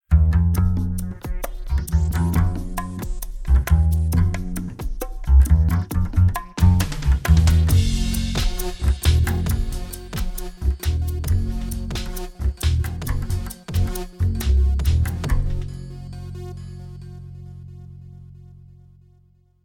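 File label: comedy Double Bass Electro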